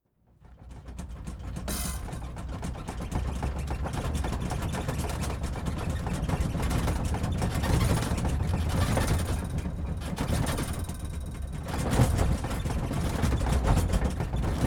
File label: crashed plane